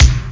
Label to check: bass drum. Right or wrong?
Right